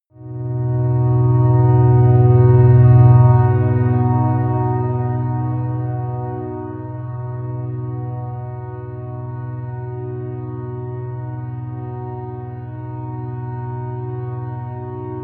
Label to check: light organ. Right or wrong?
Right